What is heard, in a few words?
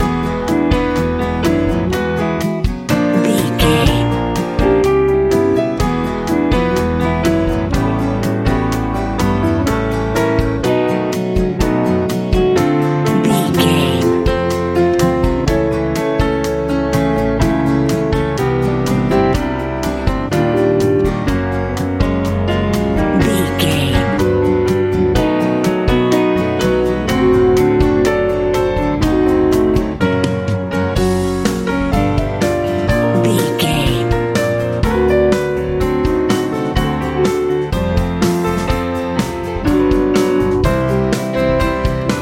bright hot big wind sprint love easy listening